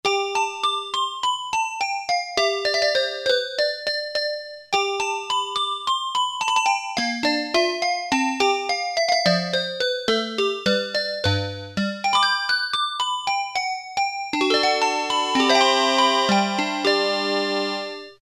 Synth Vib, Casio MT-600; Tones

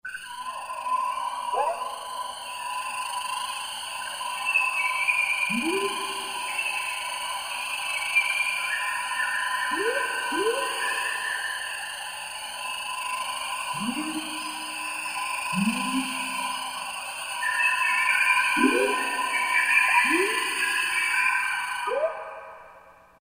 Rain Forest, Roland JV-2080

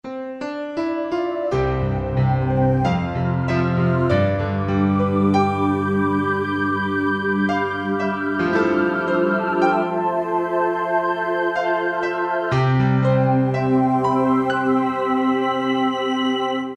Piano oohs, Alesis QS8; Factory Preset examples

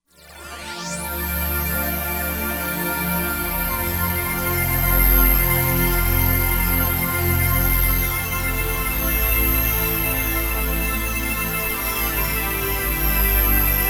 Roland Fantom XR; Ring Worldz

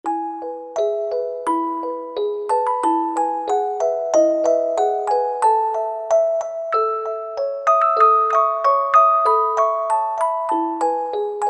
Music Box, Korg T3

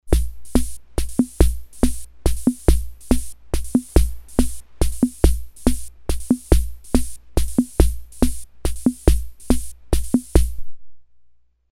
MIXED, Ace Tone Rhythm Ace FR-2L

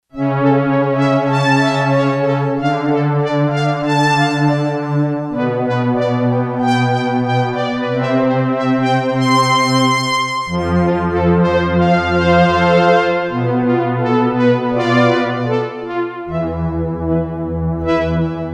Live Strg, Yamaha TX802; Factory Patches; Voices

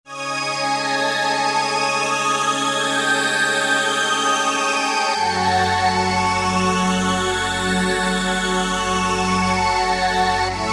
SH Strings, Roland V-Synth; Factory Patches

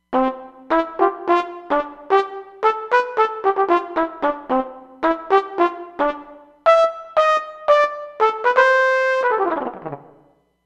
Spit Flugel

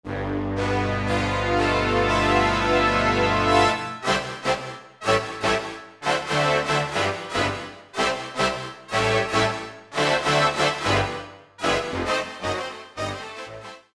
Big Band Brass, Roland SR-JV80-01 Pop

